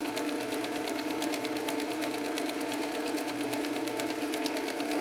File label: empty mechanical cash counter running